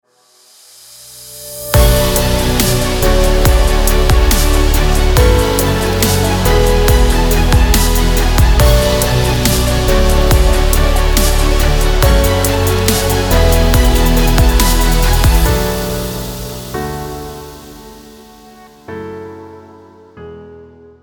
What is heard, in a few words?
abstract cinematic